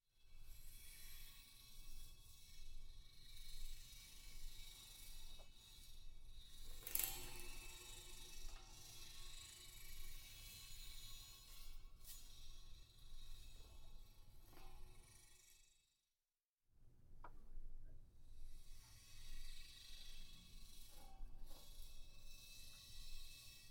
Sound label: Metal Carbon Steel Bowing Brushing OmniMic; Guild Wars 2 (Original Foley Soundtrack)